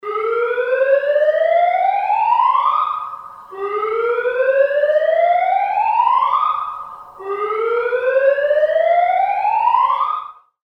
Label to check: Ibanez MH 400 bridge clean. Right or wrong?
Wrong